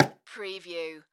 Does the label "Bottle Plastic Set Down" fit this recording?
Yes